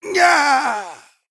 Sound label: Male Human Death